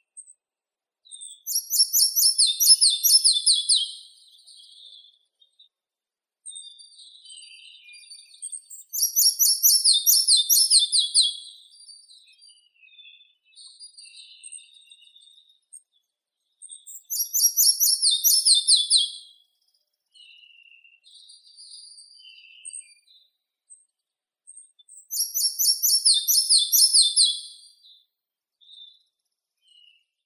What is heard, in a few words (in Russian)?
muholovka pestrushka ficedula hypoleuca